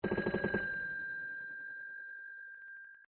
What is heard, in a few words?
Telephone Wooden Automatic Dialing Wallphone Ring Short; Various old telephones